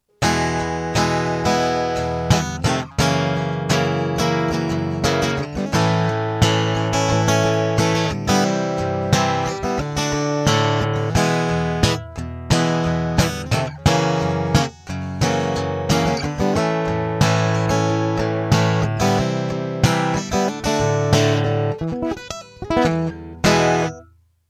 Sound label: maton strumming mic; maton ebg 808